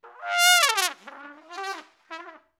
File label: TRUMPE, Trumpet FX; Roland - LA Composer vol. 2